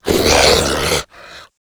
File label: zombie vox male runner run front; rave in the redwoods